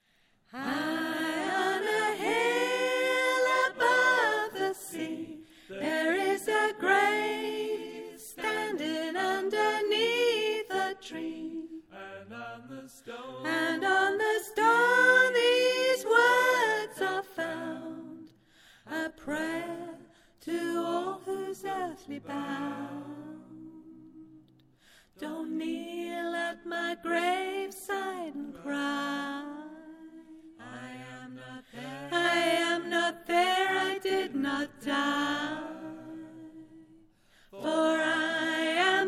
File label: Dont Kneel at My Graveside soprano